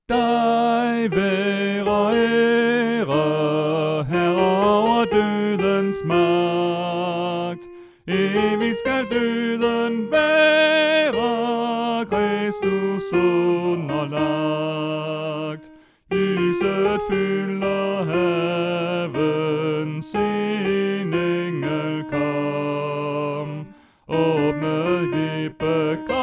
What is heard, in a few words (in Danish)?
Sopran; oevefiler